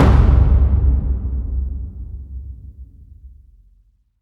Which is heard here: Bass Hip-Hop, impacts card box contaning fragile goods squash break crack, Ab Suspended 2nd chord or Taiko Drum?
Taiko Drum